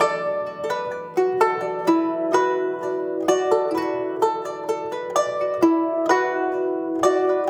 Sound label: Traditional Guzheng; Asia; Continental Drift Aftershocks